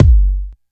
large room bass drum g key

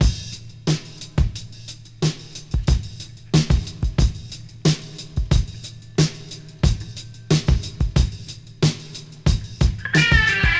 91 bpm drum loop A sharp key; drum loops

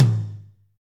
long tail reverb tom drum sample g sharp key

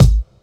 mid range steel kick drum sound G sharp key

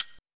airy pedal hi hat one shot d sharp; hat samples

